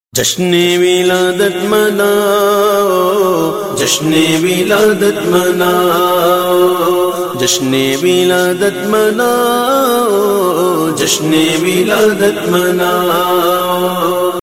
jashn e wiladat naat ringtone

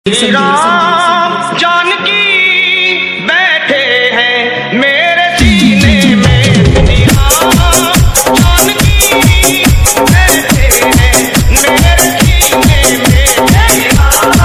shree ram remix ringtone

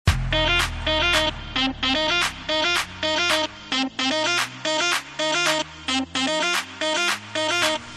hip hop call ringtone

